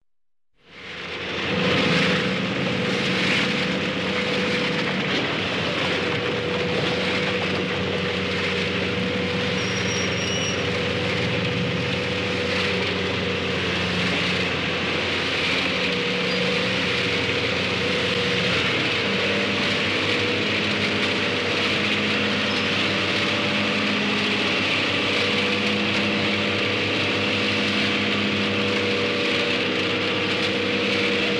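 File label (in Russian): звук работы механизмов комбайна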